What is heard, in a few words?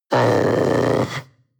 panther young grunt deep